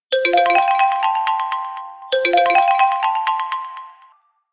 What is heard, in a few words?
Rain; Coolpad; Tiptop-N2M